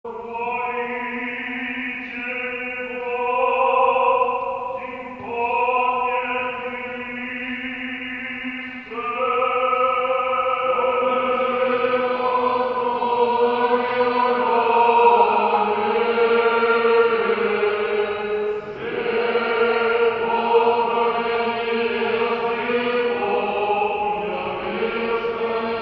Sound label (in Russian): Рогожский хор; Стихера на хвалитех Стерению; Свет во откровение языком